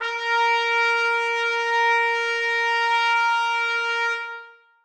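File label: trumpets sustain a#4; Sonatina Symphonic Orchestra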